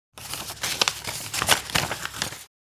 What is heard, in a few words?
Paper handling unfolding